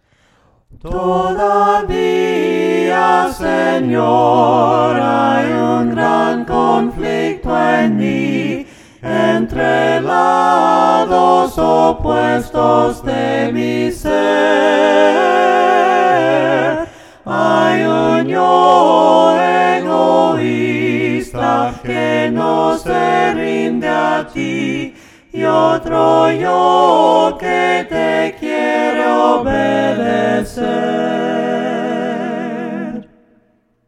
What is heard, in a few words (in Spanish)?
VOCES, himnos a capella; cantos del camino